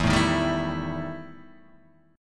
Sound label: E Minor Major 7th Double Flat 5th, Guitar, DADGAD